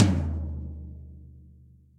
Tom Floor Dry; Drums; Drum Hits; Session Dry; Ableton; Factory Packs; Session Drums Studio; MPE Hits

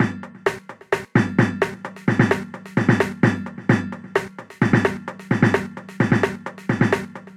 Jungle Beat 172bpm, MIDI Drum; Ableton; Factory Packs

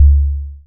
analog tonal, Kickbank

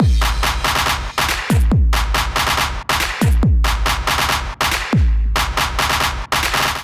Beat 140 bpm; MIDI Clips; Ableton; Factory Packs; Skitter and Step; Off Track

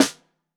Bottom, Snare; Ableton Foundation FreePacks; Snare Designer

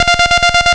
Sawtooth Trem F4; Ableton Foundation FreePacks; Foundation Glitch Toys & LoFi

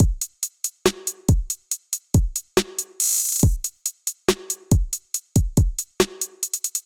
Kit 70 bpm, Trap Drums; MPE Crux Kit; Trap Drums by Sound Oracle; Ableton; Factory Packs

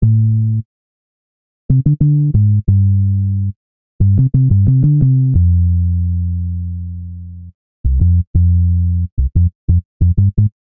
Bass A Minor 90 bpm, Bassline; Tonal; MIDI Clips; Drive and Glow; Ableton; Factory Packs

